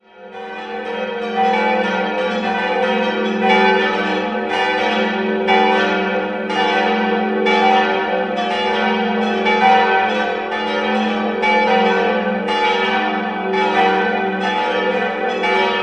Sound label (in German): Glocken, Neuburg-Bittenbrunn, Pfarrkirche; Neuburg a. d. Donau-Bittenbrunn, Pfarrkirche St. Lucia; im Jahr 1680 bei Johann Schelchshorn in Neuburg